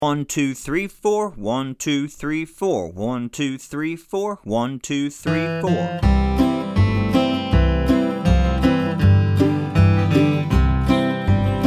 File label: Beginner Guitar (Key Of C) 80 BPM; Wildwood Flower